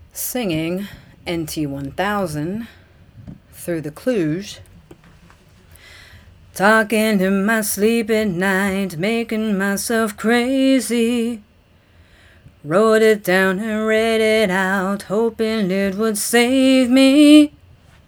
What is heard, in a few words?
Singing; KLUDGE NT1000; Singing 2; Talking 3a